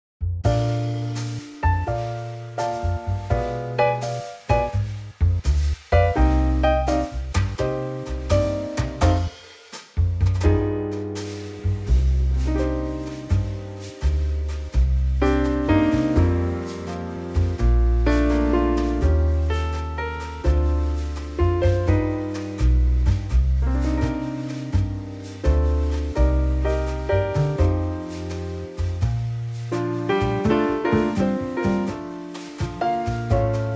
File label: Bb Trio